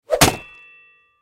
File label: Sword Impact Wood; Combat; Warfare; Blastwave